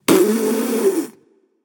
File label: tongue, voicepack; smite; pele